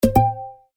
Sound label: start, slot